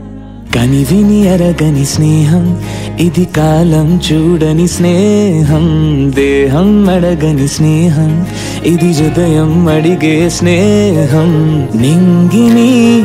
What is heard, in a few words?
song ringtone